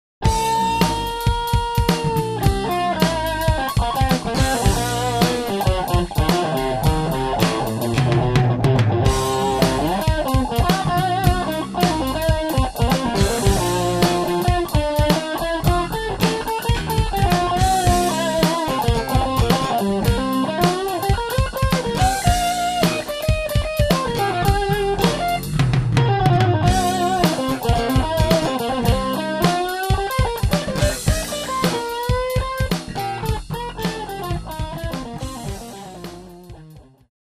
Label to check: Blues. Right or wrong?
Right